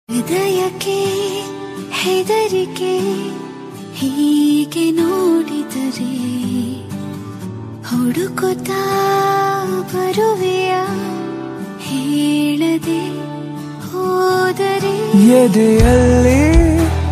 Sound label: lovely feeling ringtone, bollywood ringtones